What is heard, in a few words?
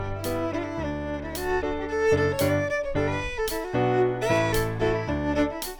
hihat